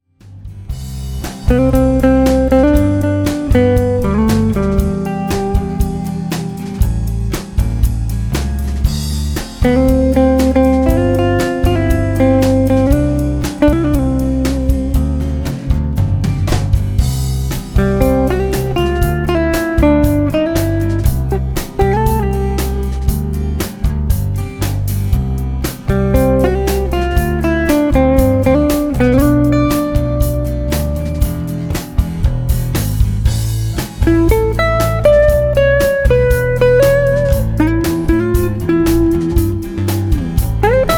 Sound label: Dinner Jazz